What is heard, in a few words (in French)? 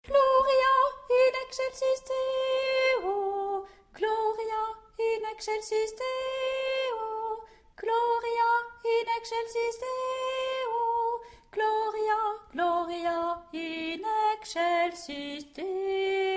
gloria in excelsis 3e voix; sur la route des rois